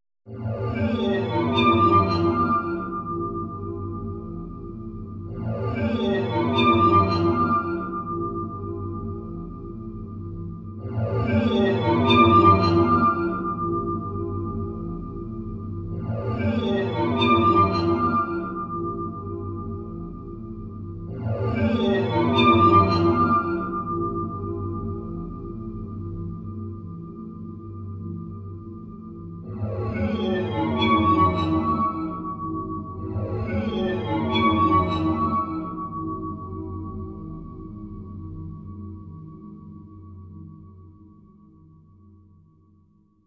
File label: waterphone solo